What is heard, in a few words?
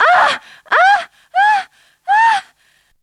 SEX; Zero G; Man Machine